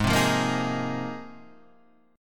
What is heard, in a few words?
Ab 6th Add 9th Ab, Guitar, Half Step Down